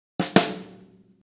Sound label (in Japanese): スネア･ドラムの弱打と強打です。 音量に大きな差があり、非常にミックスしにくい状態です。; オリジナル